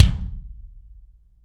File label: BD AMBIENT, Drums; Real Mega Drums